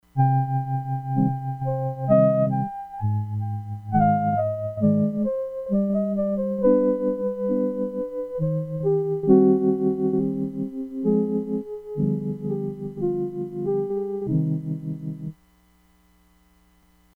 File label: DISTANT FLUTE, Crumar Bit 99; Factory Programs